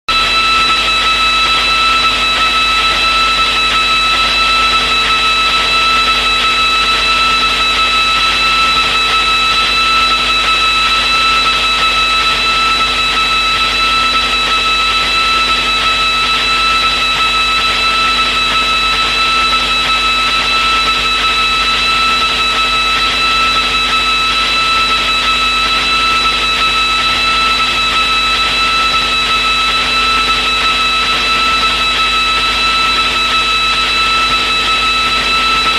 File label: Morning Noise Broadcast